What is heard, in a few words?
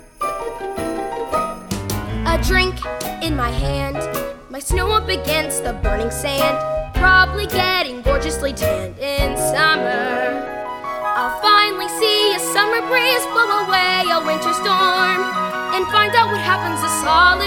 audition vocals